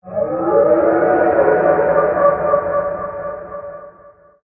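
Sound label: Creature Sea Monster Distant Call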